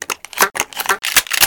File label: shotgun; freak fortress 2; gordon